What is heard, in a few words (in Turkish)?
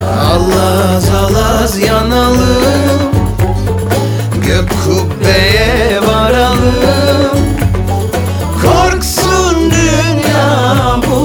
Zil Sesi